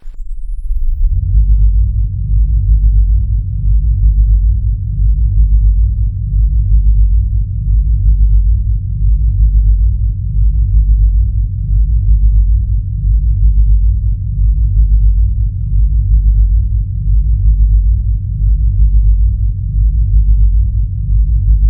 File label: Engine room, game music; A soundscape of the sound on a deep engine sound, occasionally interrupted by a steam soun